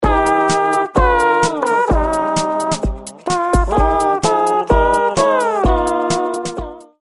O Canada National Anthem Comedy

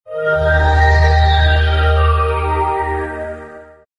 hovering flute

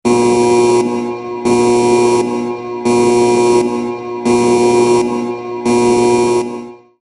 Caution Warning Alert Alarm

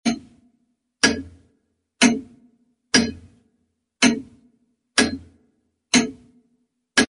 clocks grandfather clock ticking

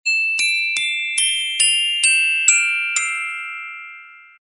orchestra bells winter logo

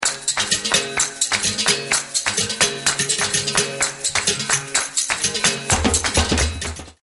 brazil claps and strum rhythm

